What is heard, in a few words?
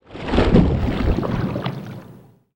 Siren Wave Stand